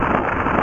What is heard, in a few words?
chomp Rock Roll; N64; YOSHISISLAND